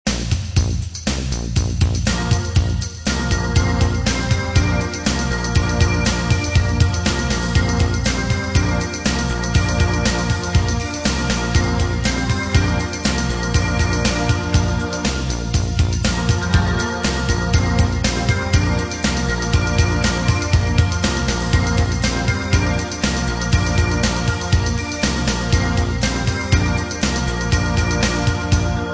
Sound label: nice beat